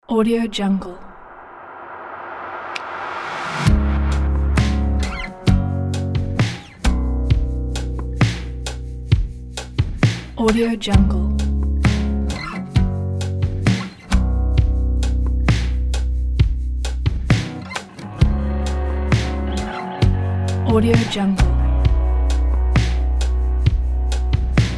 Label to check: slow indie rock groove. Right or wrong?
Right